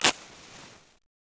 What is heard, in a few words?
Match Strike; Civ4 warlords